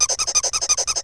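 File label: bed squeak, cartoon; gfactory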